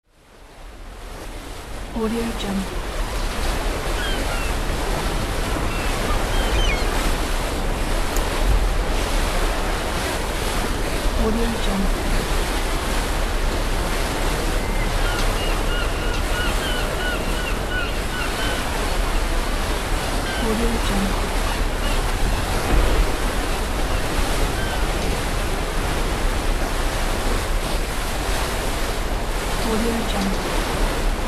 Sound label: sailing ship ambience